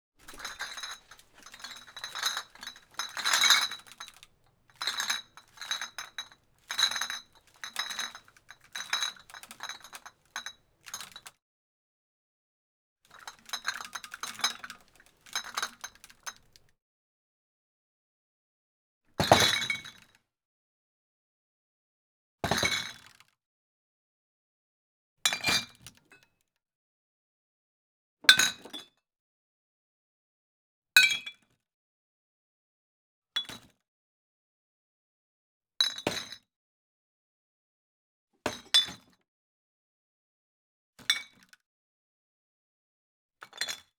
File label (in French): BAR BOUTEILLE VERRE MANIPULATION DEPLACEMENT POSER COMPTOIR